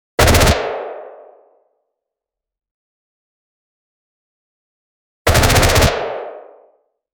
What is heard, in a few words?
good smg gun single shot